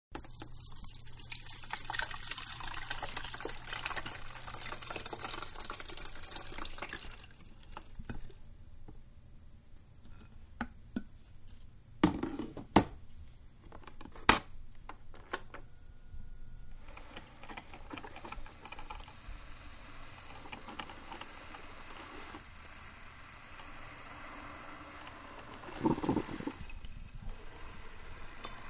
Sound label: Coffee Preparation; Kitchen (Sound Effects:Household); Manhattan Production Music